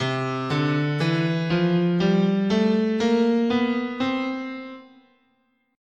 C Bebop Dominant, scale

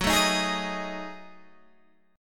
F sharp Minor 6th Add 9th, Guitar, Open G

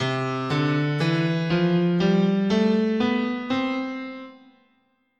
C Ionian, scale

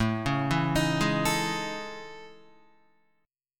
A Major Sharp 11th, Guitar; AM#11 arpeggiated

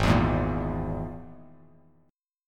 G sharp Suspended 2nd Sharp 5th, Bass Standard; G#sus2#5; strummed; JGuitar

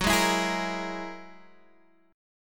G sharp 7th Suspended 2nd Sharp 5th

